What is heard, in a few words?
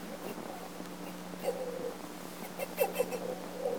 Inia geoffrensis; Silver Springs, Fla. 3-Oct-1956